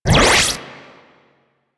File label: Laser Rifle Stop; Crp Laser Rifle